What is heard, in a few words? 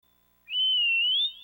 Bird Whistle